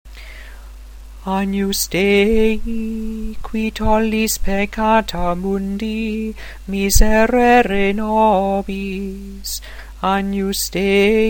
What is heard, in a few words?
mass xviii agnus